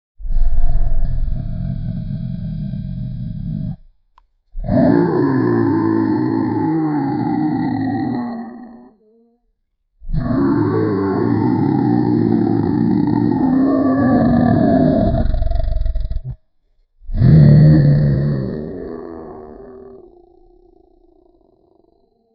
big beastly creature roar, furious

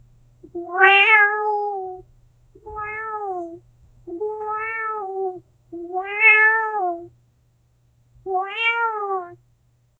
funny cat conversation li

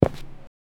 step on asphalt concrete

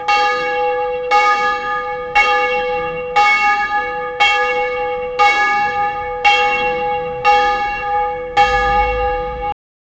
medieval church bells

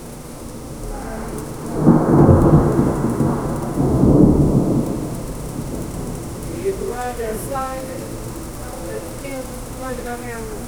coffee shop during rain p, chatting

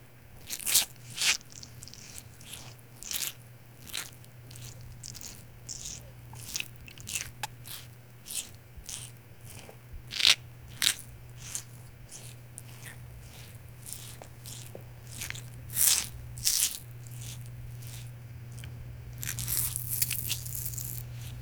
crunchy sand asmr

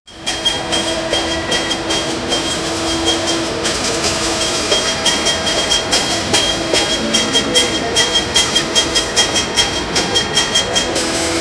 workers on construction

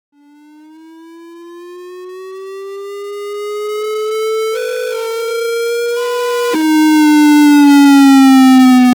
8 bit sound a game charac, portal

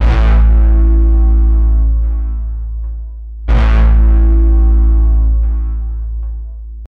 80s bass synth sound; electronic beat

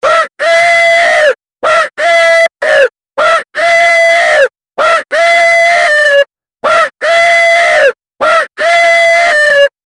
its like red flag, alerts

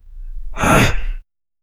a small burst of pain, expression

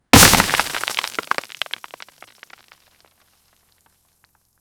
construction

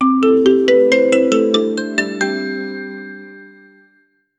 christmas jingle bell

